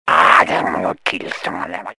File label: ahh; chatsounds